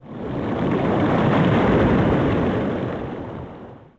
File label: wave overhead; DAoC